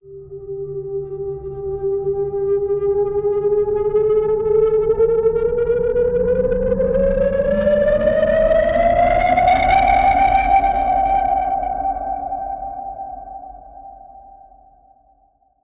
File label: Past Life Whistle Rise